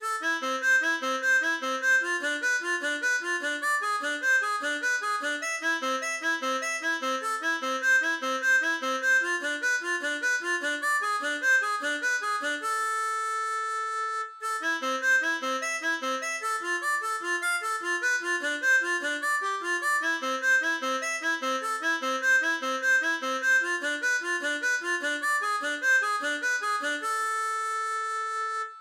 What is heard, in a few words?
Etude Mi Mineur, harmonica; Tarrega